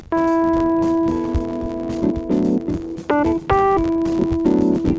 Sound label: Latino